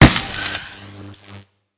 hit; smite; god raijin

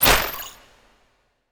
pounce impact; smite; Arachne

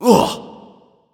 ugh

